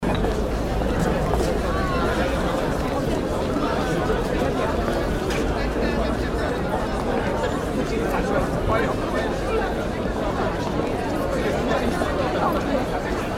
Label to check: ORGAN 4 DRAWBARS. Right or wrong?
Wrong